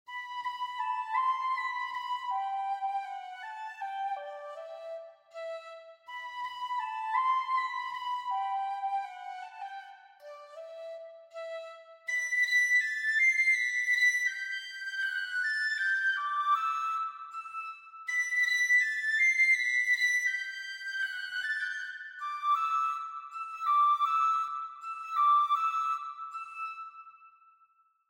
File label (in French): Flageolet Anglais; Les Valkyries